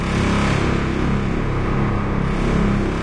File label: zapper ambient loop; Garry's Mod; hl2; citadel